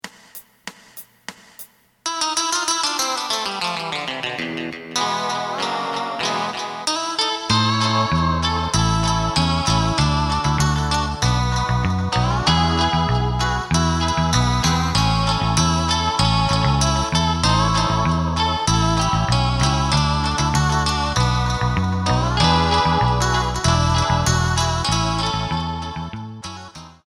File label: drum